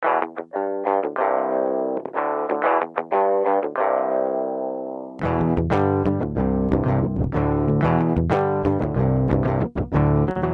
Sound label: GUITAR SAMPLE NO FX